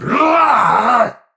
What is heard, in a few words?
Pain Heavy; Tau; Dax; Battle Barks